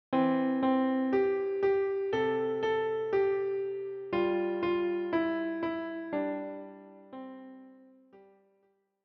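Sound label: piano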